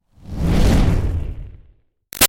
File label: Fireball Whoosh Flamey Huge; Sound Design; Whooshes; BigRoomSound